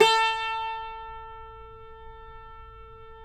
CUMBUS A 4C, Guitars; Spectrasonics; Hans Zimmer Guitars VOL-2

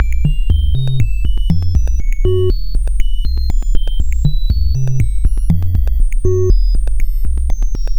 Sub Sine Beat, Drum Loop; Sonic Foundry; The Electronic Music Manuscript Collection; Drum Loop Structures

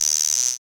CR-78, analog; Sound & Vision - Gigapack I

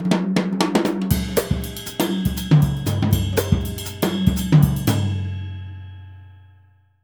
Jazzistic; Univers Sons

